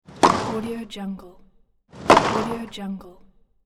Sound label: tennis serve